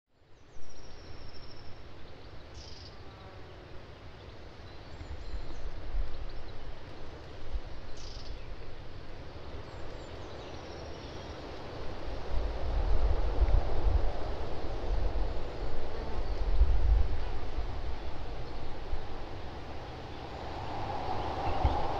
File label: Wind Countryside Italy